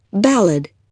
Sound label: ballad